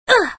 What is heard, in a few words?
Damaged; Tails; Tails Nightmare 3 Demo